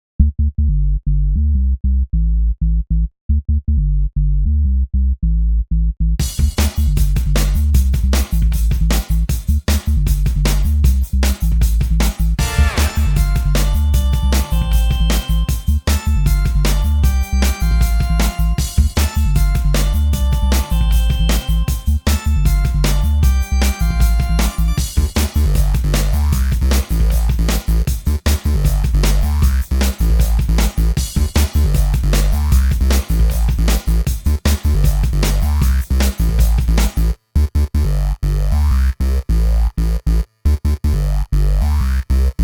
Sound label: Instrumental